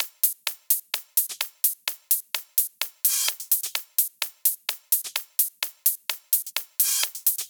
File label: 128BPM Drums; Vengeance Samples; Zoo Kit